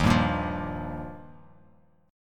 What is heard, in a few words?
D sharp Major Add 9th D sharp, chord; D#add9 strummed; 11,10,8,10; JGuitar